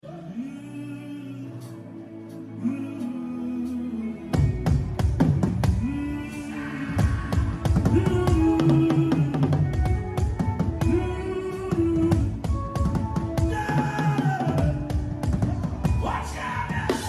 Drummer Chant

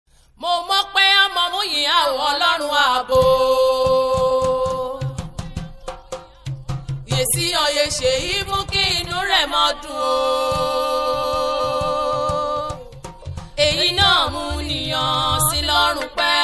Ijesha Dialet Gospel Song

